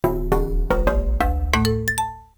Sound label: Xylophone Melody